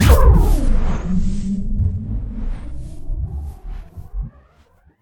Goblin Spell

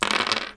357 Magnum

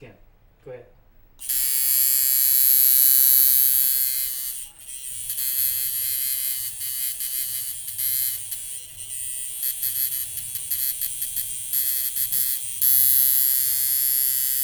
Tattoo Needle Sounds

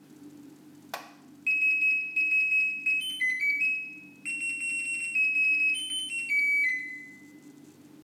Washing Machine Bells

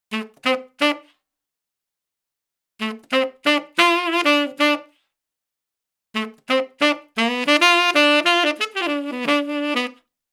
90bpm Growing Phrase

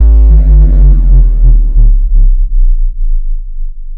Shut Down Bass

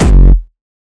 Hardstyle Hardcore Kick

